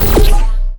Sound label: portal open red, portalgun; garrysmod